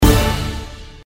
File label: scatter 5; Journey To West